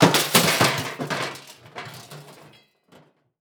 Crash Aluminum Trash Can Filled With Debris Tumbling On Cement; crashes; AdobeCC